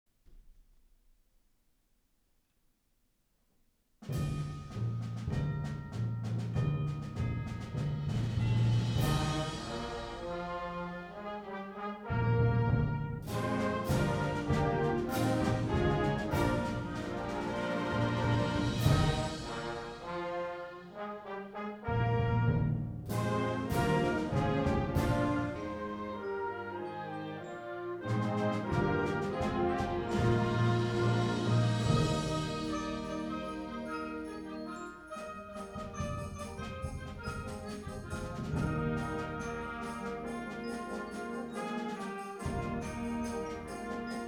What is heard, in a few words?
Easy A Festival Fanfare, Spiral-Bound Score